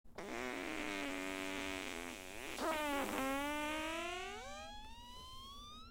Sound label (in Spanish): Final a 2 voces, pedo